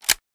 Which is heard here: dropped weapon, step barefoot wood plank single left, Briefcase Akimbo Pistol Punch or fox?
dropped weapon